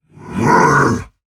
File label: Attack Effort; Warframe; Dax Heavy; Battle Barks